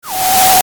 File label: retro; maoudamashii